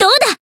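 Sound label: Voice attack, Japanese; isuka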